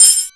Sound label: Multi FX, Drum Machines, E-MU Pro-Cussion